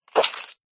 hospital syringe suck gel medicine from plastic container